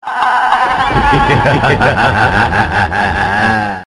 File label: Horror Sms Ringtone